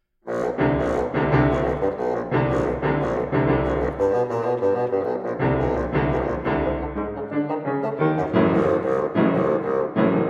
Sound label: Duet bassoon and piano